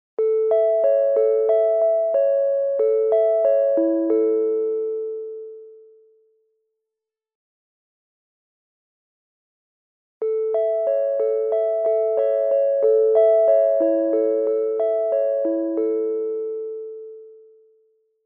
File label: chimes; KTK